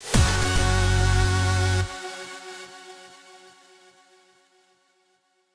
Retry Jingle; Unbeatable; Friday Night Funkin' Mario's Madness; PC mod gamerip

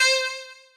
Jingle 321 Mono; Shin Hyu Stone; 3DS

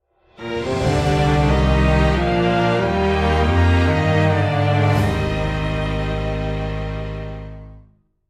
Jingle ~ Intermission; The Great Ace Attorney Chronicles

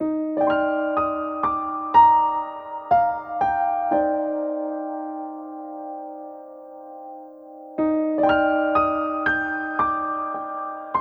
Sad theme; CrossCode; Switch PS4 Xbox One PC macOS Xbox Series X Linux

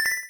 Coin Taken; Collectible

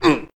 Dwarf Hit; The Battle for Wesnoth

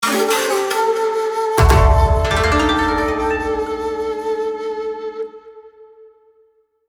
Fanfare; ukiyo no shishi ps3 gamerip 2015

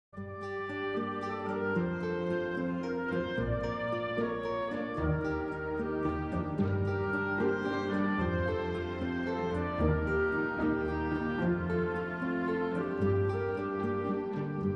Orchestral Short

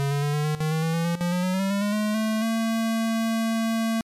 Lute Tuning; The Black Cauldron; IBM PCjr

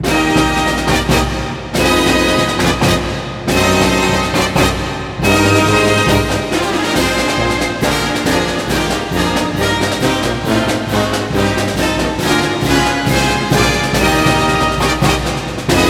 Broadcast Outro

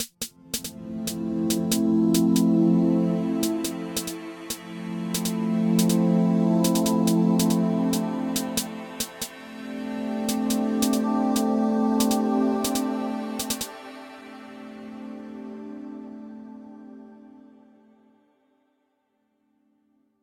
Start Loop; Mixolumia; Tessellation

